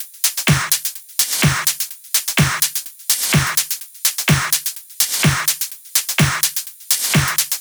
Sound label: 126BPM Kit; Vengeance Samples; VFH2 126BPM Secrets Kit